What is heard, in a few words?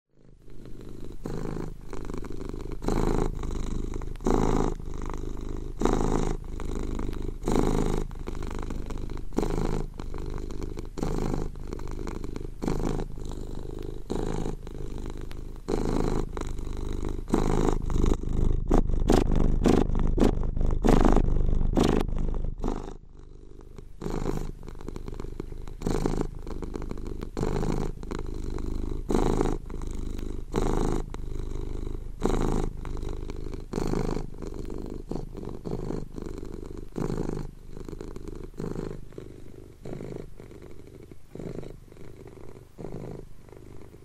Ronroneo de gato - cat purring; sonoterapia; ANIMAL FLOW